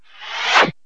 Teleport; REDNECK; RAMPAGER

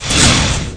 WIPE; SEGACars

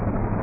tank

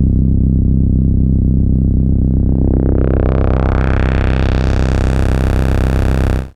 big sweep, Synth

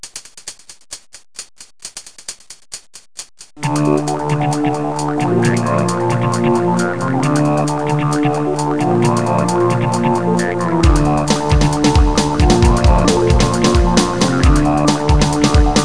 theme

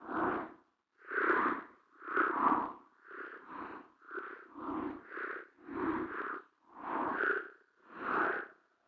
activation Button; Keepsake; puzzles

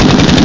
mach

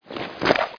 sound put weapon away; MOTS

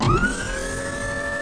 slide

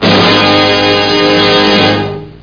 tadah; My Animated Word Book; farm

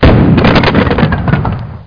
cat die

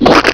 SPLAT; Death from Above

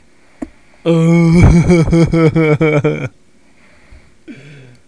UHH; THESEUS

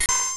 menu command; Ff8

